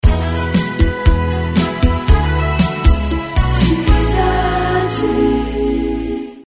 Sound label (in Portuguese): Vinheta; Cidade FM